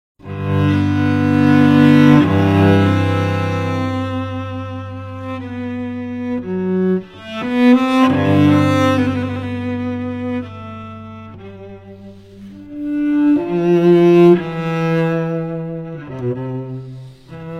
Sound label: Bach, ringtones; Nokia